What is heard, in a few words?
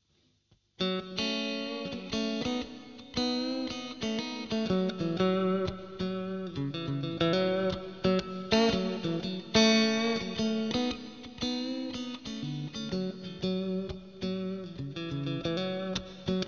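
Mitte Clean Jazz Chorus, vp strat56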